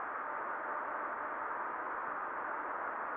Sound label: tv static